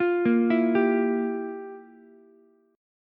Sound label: F Major 7th Suspended 2nd Suspended 4th, Ukulele; arpeggiated; JGuitar